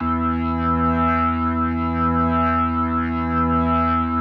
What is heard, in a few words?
E2S, B3, Vintage Keyboards